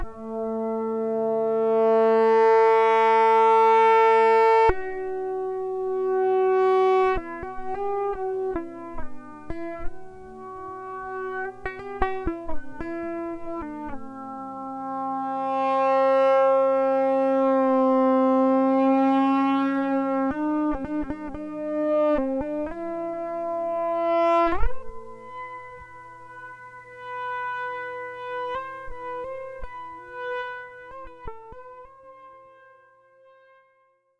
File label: polytonal rhythm; Active Control